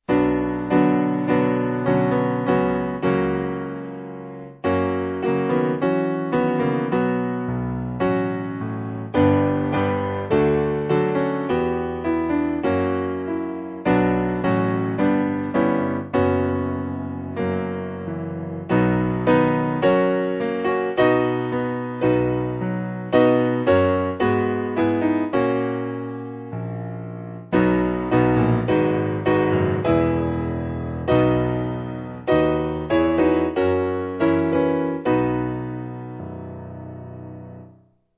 Faster St Andrew Piano; Simple Piano

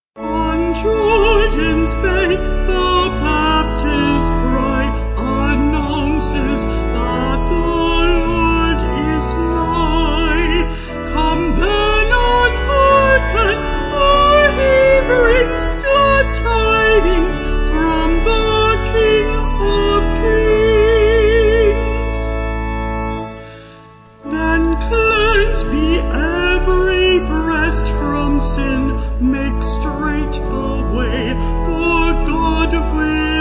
On Jordans Bank Winchester New Vocals Organ

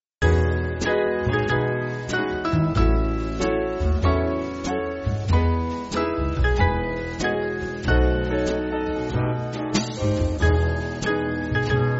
Band

